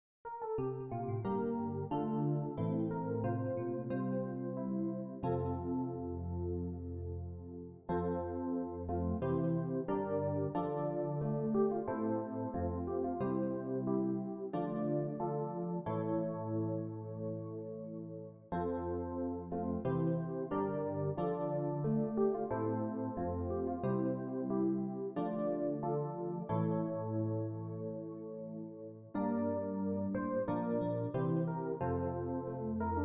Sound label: Pavane; Mid Age Pavane